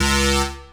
stab; Dirt-Samples

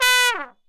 trump stab b low oct

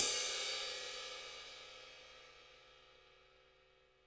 ride cymbal, gretsch; Dirt-Samples

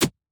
hawaiian short

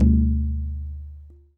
lower hit, tabla; Dirt-Samples

